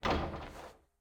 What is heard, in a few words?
reel Bounce; rage of the seas